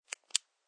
Bic Pen Off, Clicking sound of a ball point pen off.; Classroom; Schools